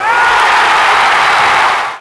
big wow; WhiteOut; XBOX; tundra

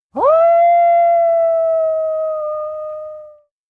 Howler Call; Weapons

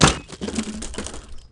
fail; Matt Hoffman's Pro BMX 2; XBOX

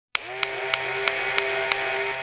button door; Soldier Of Fortune II Double Helix; XBOX

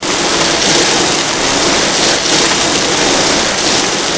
dirt scrape

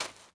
footsteps sand

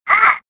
land; Star Wars Jedi Knight II Jedi Outcast; XBOX

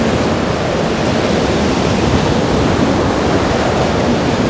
blight; The Elder Scrolls III Morrowind GOTY; XBOX

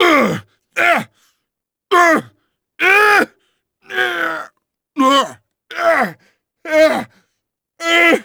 HARP

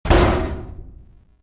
probe door fall; movers objects

